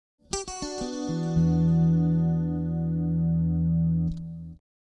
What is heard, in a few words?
Guitar, Hip Hop Exotica, 102 Emin